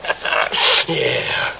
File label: ha ha sniff yeah; ooh ahh